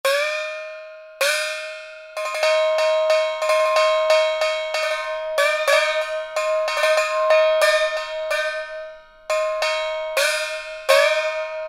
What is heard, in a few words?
China Shau Lou; Yamaha A4000; World - Latin Instruments; China & Japan